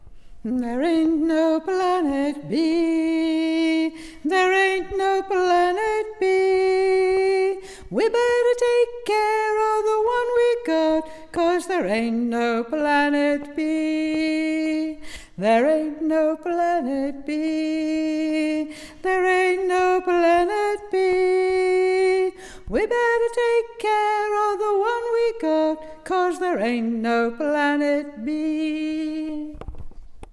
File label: There Aint No Planet B